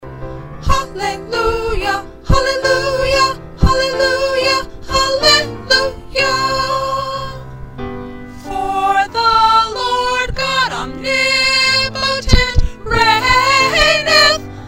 1st soprano

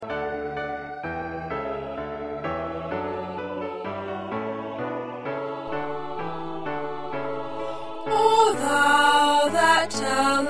Alto, choir parts

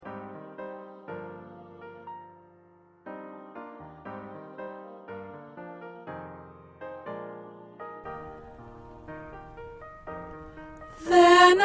Tenor

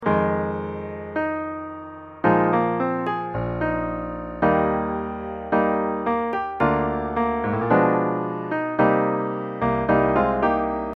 Piano

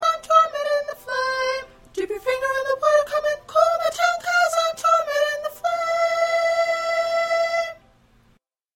SSA Soprano 1B; soprano 1b part

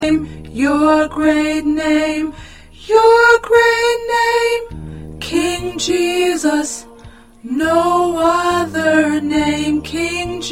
P Tenor

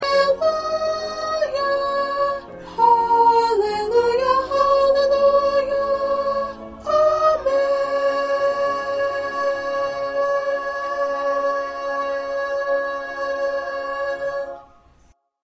2nd Soprano

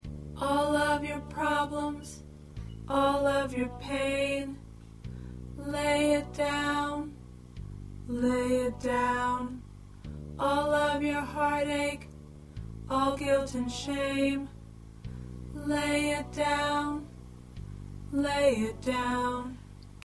Alto, choir

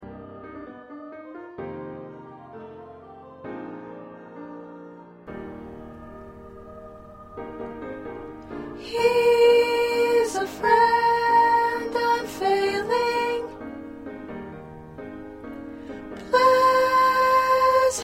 Soprano

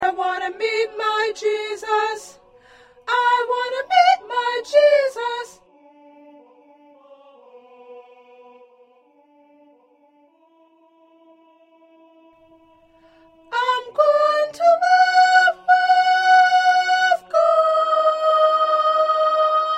2nd Soprano

